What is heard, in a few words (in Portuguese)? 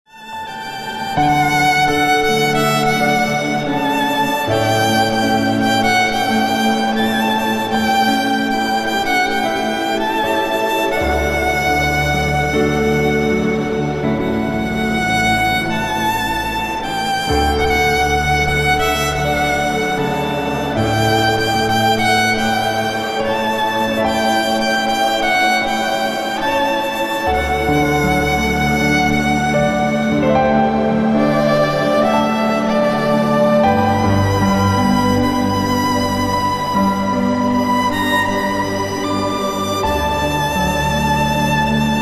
Solo de Violino; Alecrim Dourado